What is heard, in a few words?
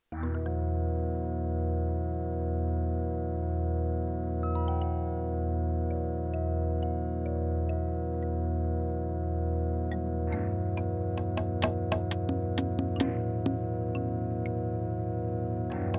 intro, gus pnp megaem mt; Gravis Ultrasound PNP (MT32 emulation megaem); soundcard test